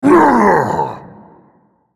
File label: spellcast effort; Hecarim